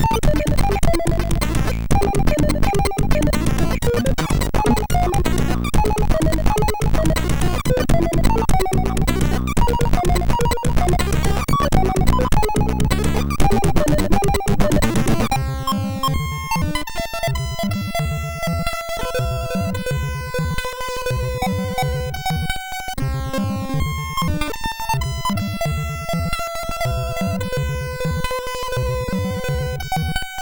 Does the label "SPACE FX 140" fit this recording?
No